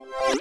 Click

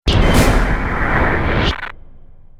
Stinger Action Short

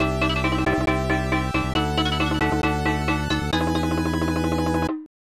Promotion; Ozumou Tamashii; SNES

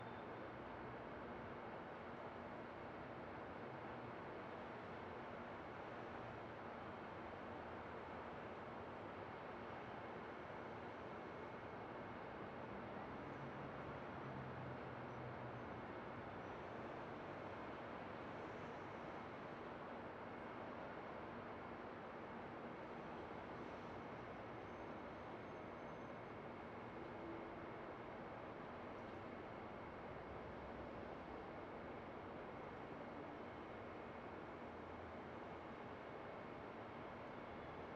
ambience - london city

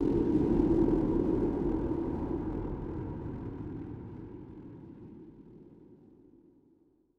Music; Sifu

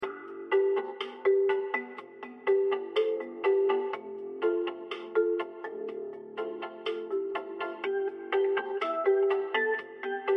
Elevator Music; Budget Cuts

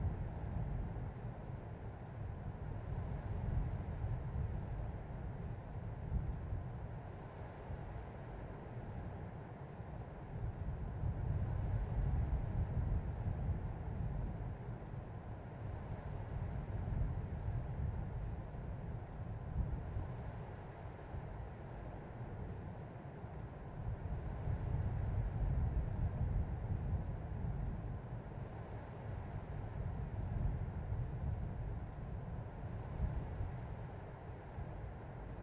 HILL DAWN

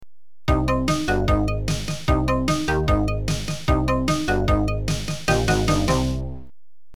level completed, amiga; les aventures de moktar

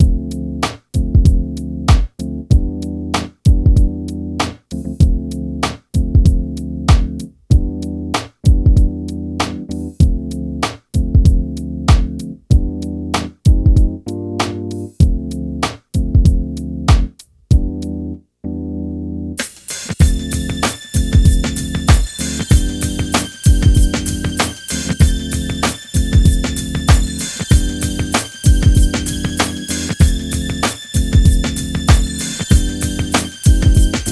(No Vocals); Generation Girl Gotta Groove

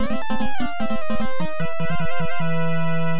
Trap; meikyu jiin dababa fds

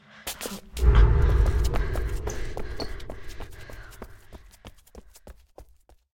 Run; silent hill homecoming 2008 gamerip pc ps3 x360

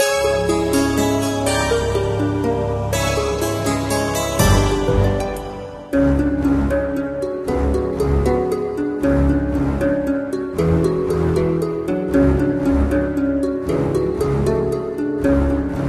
Ice Palace Background Music; Pixel Gun 3D

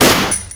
ABAKAN

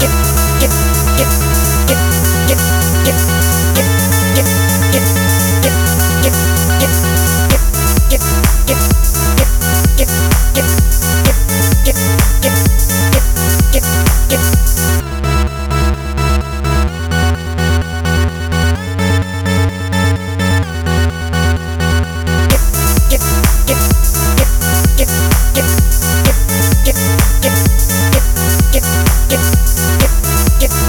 Music; soccer slammers switch gamerip 2018; Soccer Slammers Music01

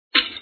Broken Ice

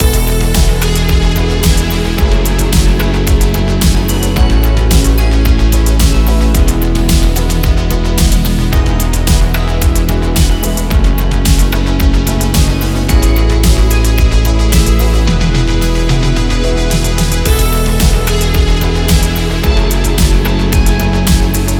Coaster Ride Music; Postal 4 No Regerts

